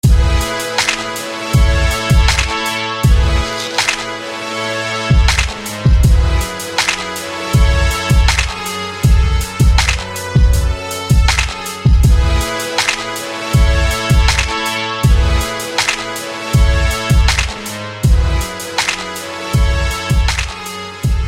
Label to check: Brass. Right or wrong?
Right